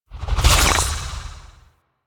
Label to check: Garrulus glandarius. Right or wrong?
Wrong